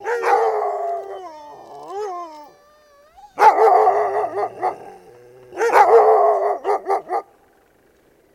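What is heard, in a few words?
Dogs Bark Meaty Exterior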